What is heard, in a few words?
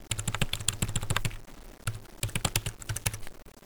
Keyboard Sounds; Learn to Fly 3